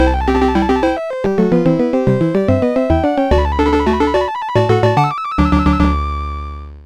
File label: jingle; Traps n Treasures